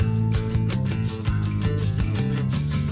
guitar

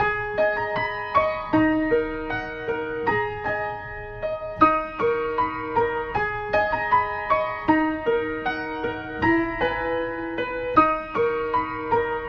piano forlorn, Loops Sad

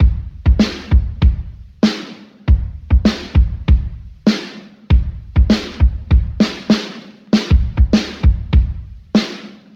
Drum loops, Funky

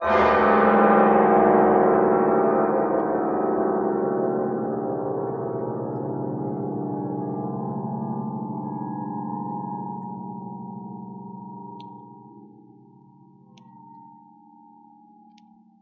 horror dissonant piano stab

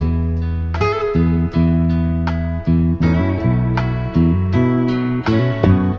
guitar, Sad

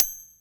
zippy, Bells; Misc Percussion

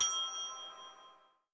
triangle ding; Misc Percussion

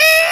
eee shrill guy, voice; ooh ahh

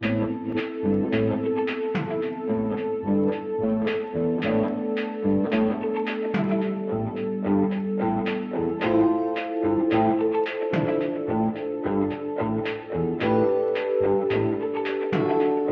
guitar classic rocking

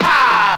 "ha" scratch

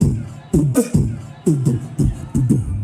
Beatbox, Drum loops

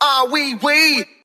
"ah wee wee"; Voice; ooh ahh

